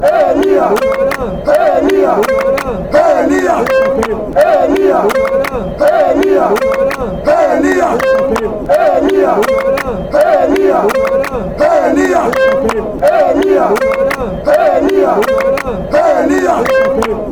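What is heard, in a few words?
fanchants, football chants; elias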